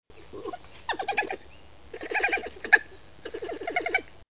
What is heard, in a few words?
chitter